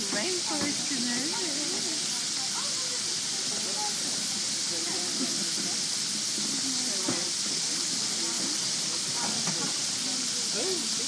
sound of carbon dioxide ice bubbling in soapy water